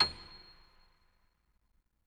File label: MF87 R, Acoustic Piano; InVision Interactive; Miroslav Vitous